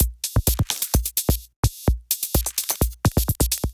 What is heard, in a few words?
Rest Pattern Kit groove 128 bpm; MIDI Clips; 122 - 130 bpm; Ableton; Factory Packs; Glitch and Wash